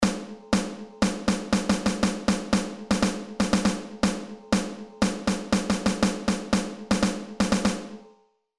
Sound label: Rhythms Snare Drum